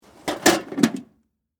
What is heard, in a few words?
Tool Box Lid Close, Industry, Tool, Box, Lid, Close, Metal, Case, Antique; BlastWave